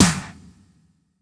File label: Elec Snr, drum